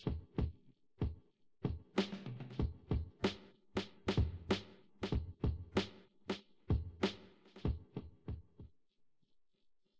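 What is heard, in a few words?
with drums, instantaneous mixture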